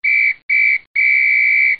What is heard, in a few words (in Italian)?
Fischio Fine Partita